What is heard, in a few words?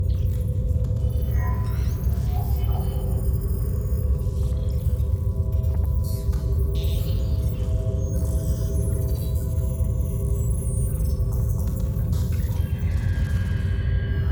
command chamber, ships rooms